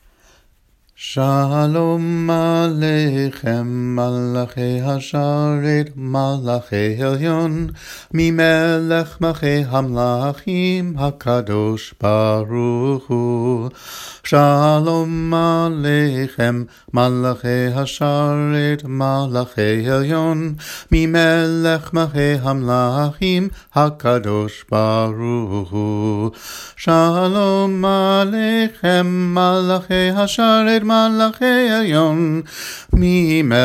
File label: Shalom Aleikhem sung